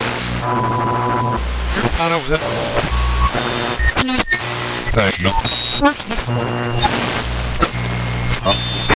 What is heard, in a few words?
Noise